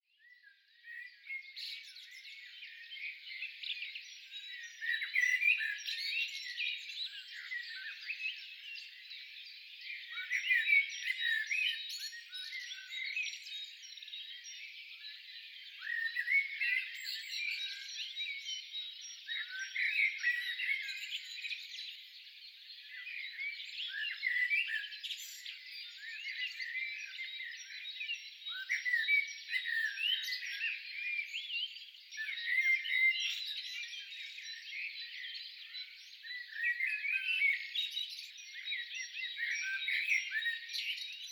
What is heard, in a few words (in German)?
Vogelgezwitscher amsel